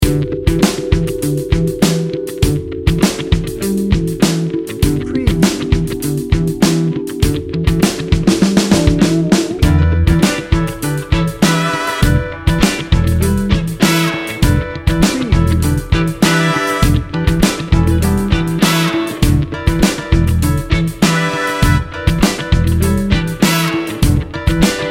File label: funk factory